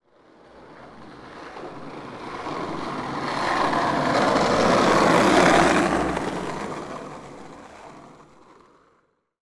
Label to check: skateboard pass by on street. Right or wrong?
Right